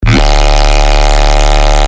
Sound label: Yay; sickrate; siik essentials iv